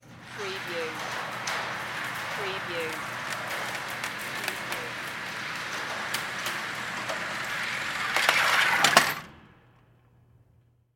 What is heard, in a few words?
Shooting Range Target; sound effects bible